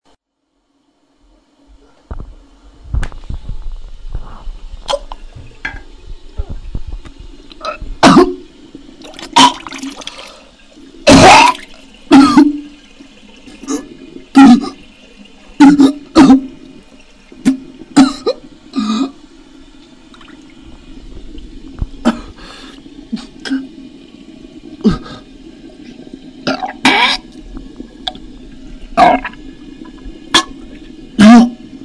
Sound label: vomit